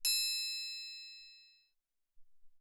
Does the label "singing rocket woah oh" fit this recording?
No